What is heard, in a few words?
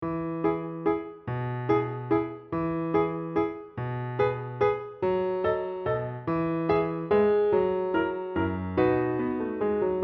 Accompaniment; Spinners Waltz; ViolinSchool